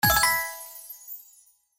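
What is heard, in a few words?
Symbol Win Scatter; slots; House Of Luck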